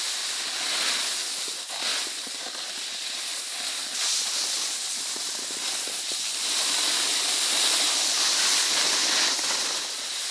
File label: slide loop; Nut Boarding